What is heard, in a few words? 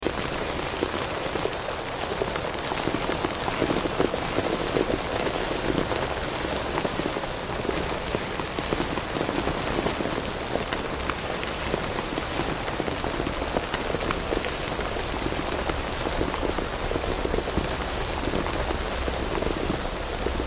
relaxing raining clouds asmr white noise